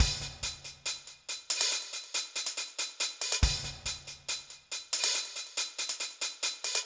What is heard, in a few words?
Hi-Hat Esoterica, Beats